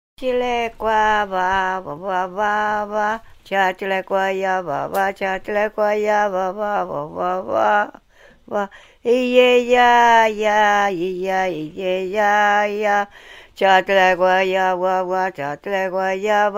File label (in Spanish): kawashkar, musica y danza